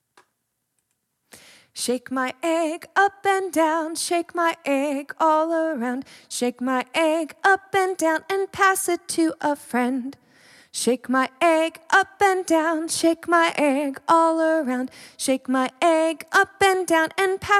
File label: shake then pass